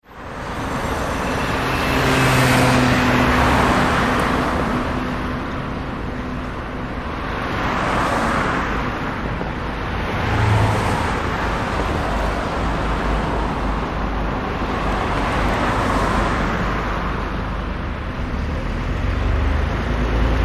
ambience urban traffic main road, Traffic Urban Main Road (40Mph); Traffic Ambience On Urban Main Road At Approx 40 Mph; Traffic and Cars; Backgrounds; McKinney Sound